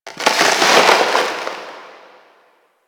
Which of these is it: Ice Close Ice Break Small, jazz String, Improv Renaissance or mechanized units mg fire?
Ice Close Ice Break Small